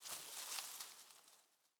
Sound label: leaf rustle crunchy med